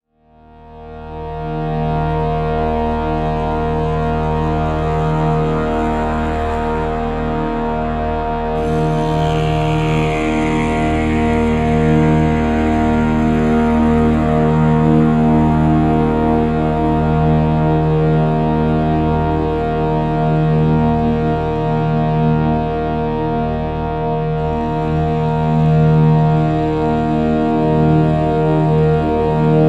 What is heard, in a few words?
Shruti Box & Overtone Voices